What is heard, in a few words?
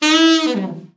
ALT FALL, SAX; Best Service Gigapack 1